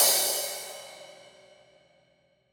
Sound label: Trash D, drum